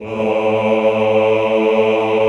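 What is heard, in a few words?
choir A1, Aaahh; Sound & Vision - Gigapack I CD 2 (Roland)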